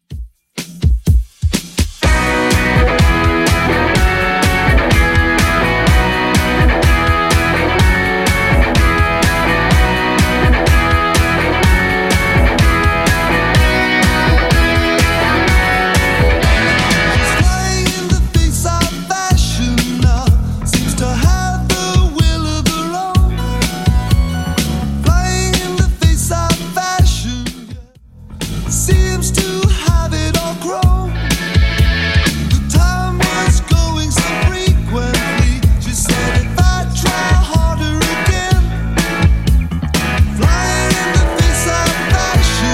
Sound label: 80s Redrum 125bpm